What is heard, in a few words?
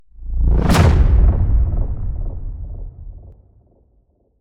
Whoosh Hit